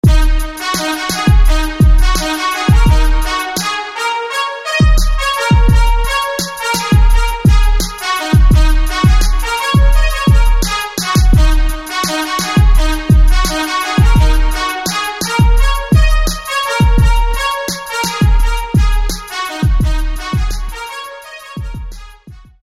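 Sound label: Trumpet Staccato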